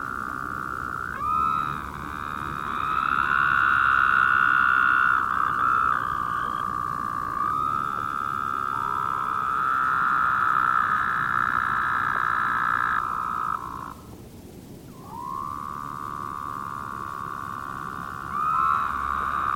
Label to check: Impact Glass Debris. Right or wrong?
Wrong